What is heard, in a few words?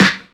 original snare f sharp key, acoustic snare sound tuned to the F# note